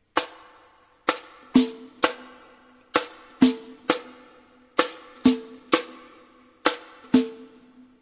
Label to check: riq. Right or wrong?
Right